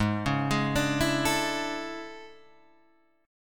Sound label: A Major 11th G sharp